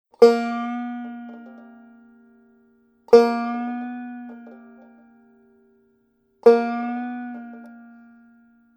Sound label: Bending Notes